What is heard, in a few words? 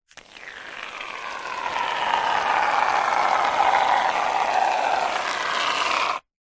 household shaving foam squirt